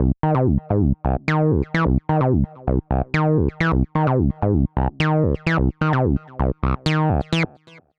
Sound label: MOD EQ SAT DLY